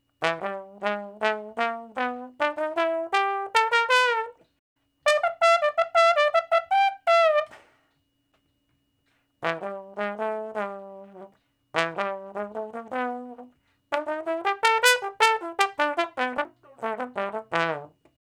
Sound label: Trombone Shuffle (E); Crimson Blue and Fabulous Horncraft 4 RnB; Horncraft for R&B; Solos